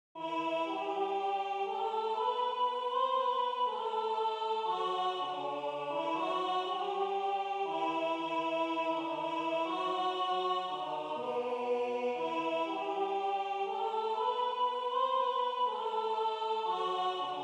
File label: Greensleves Alt; Greensleeves Teil 1 (bisTakt 41)